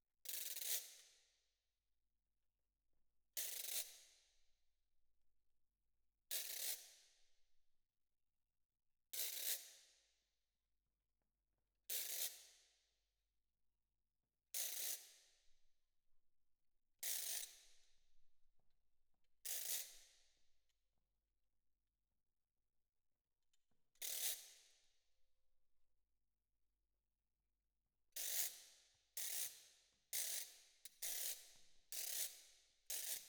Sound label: Perc